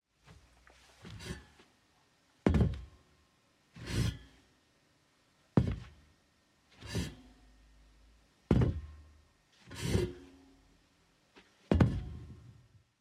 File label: Modern Seating; TheWorkRoom Audio Post